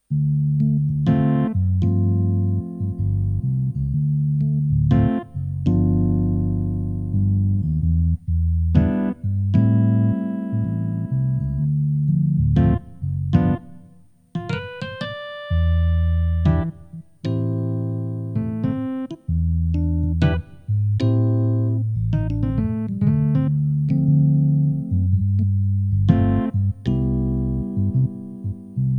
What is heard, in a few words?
E Piano